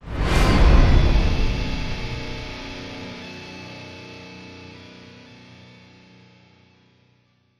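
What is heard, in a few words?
WHOOSH SOFT W GUITAR; Transformers Dark of the Moon The Game; ZETAS STAND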